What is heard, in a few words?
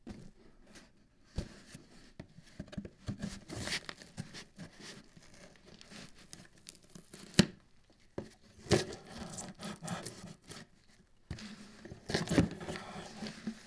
Cutting Box